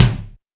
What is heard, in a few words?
BONUS BD, Drums; Best Service; Real Mega Drums VOL-1